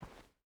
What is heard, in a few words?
footstep rubber boots cloth walk; Rust